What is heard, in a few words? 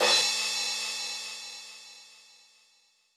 Roland U220 Electric Set, Drum Machines